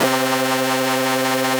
Techno Synth Shot C; Synth One-Shots; Ghosthack; 45 Free Techno Synth Shots 2025

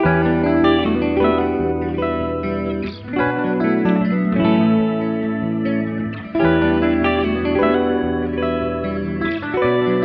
75 BPM B Min, Guitar Loops; Claim To Fame; Cymatics; Journey Guitar Loops

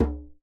Djembe; Cymatics; Emperor World Percussion (Teaser)

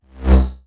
Vibes A; Tones

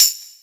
Percussion

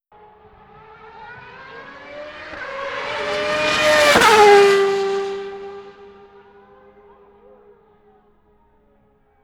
Top Fuel Car Fast By Single; Vehicles; Cars & Trucks

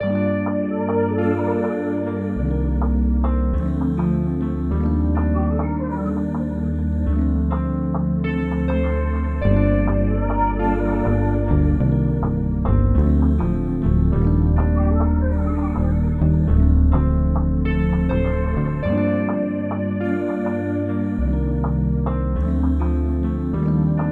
102 BPM G Min (Afrobeats); Cymatics; PARADOX II; Melody Collection

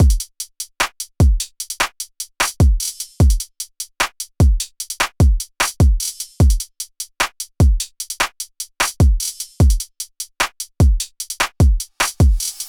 Drum Loop - 150 BPM; Worlds; Cymatics; DOPE DRUMS VOL. 1

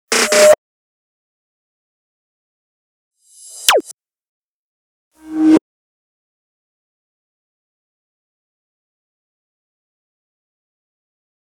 Drum Loop - 143 BPM Fills; Loop Stems; Cymatics; Hyperbass Drum Loop

